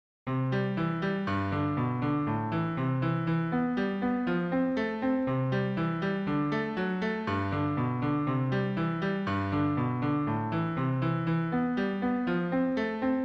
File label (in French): accomp